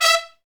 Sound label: BRS TP, Roland, Stabs; Roland L-CDX-03